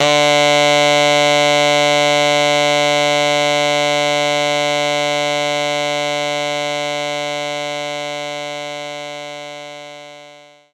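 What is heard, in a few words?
Baritone Sax F#1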